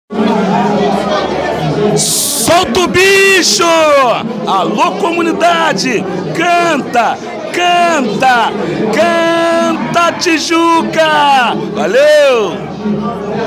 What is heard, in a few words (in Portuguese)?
grito